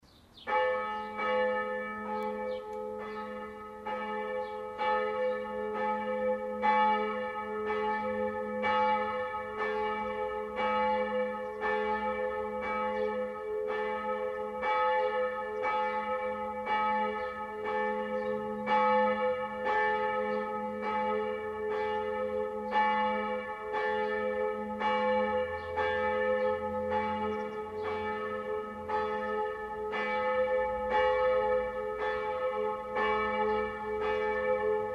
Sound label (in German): Glocke Laurentiusglocke, Stift Wilten